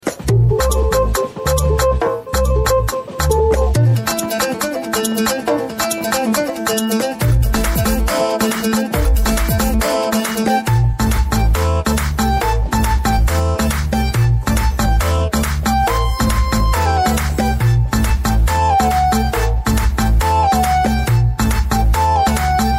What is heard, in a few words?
Instrumental